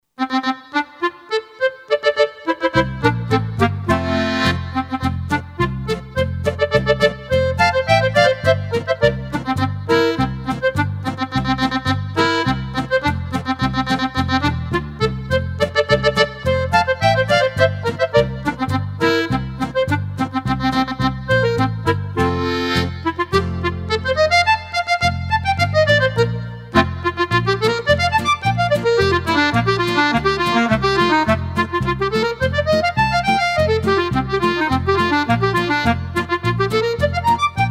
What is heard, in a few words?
Beer Polka